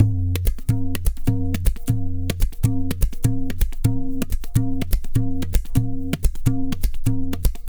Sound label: Tabla, 125 BPM; Zero-G; Pure Tabla